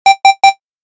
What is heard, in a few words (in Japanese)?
tenmetsu